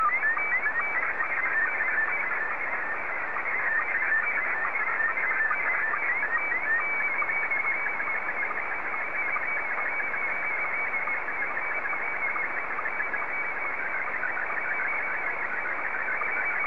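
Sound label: SSTV, Scottie 2; JA3QJQ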